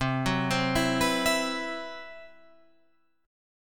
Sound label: C Major 7th Flat 5th C